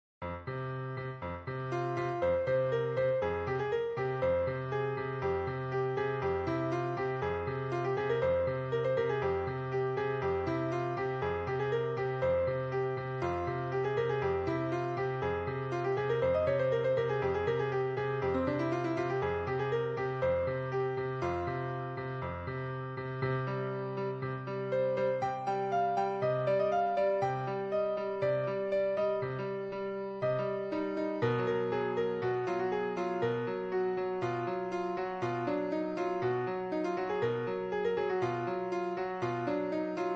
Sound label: fife and drum